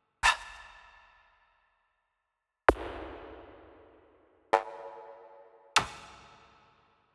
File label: Fx Vox Loop 130; Equipped Music; Loungin House